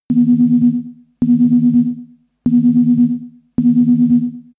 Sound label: starship ringing signal